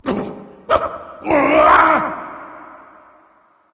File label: nv fear; smite; awilix